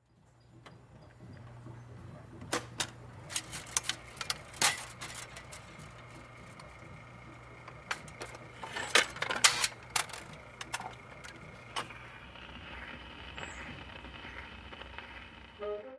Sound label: Jukebox Cu W Coin Inse